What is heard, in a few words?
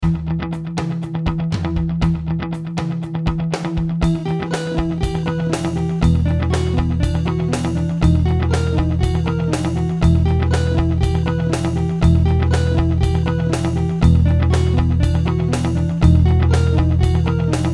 metal